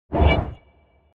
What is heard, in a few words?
sent